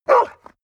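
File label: bark single, dogs; natur-tiere; hunde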